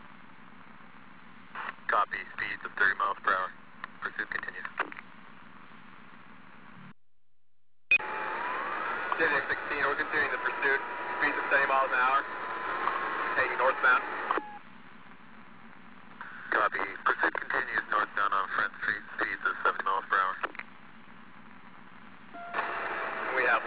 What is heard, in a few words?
radio; svencoop; buro